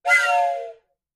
Toy Train Whistle: Double Pitch, From Close Perspective.